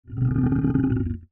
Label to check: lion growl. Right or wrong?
Right